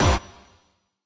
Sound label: reel Twin Quick Stop; twin happiness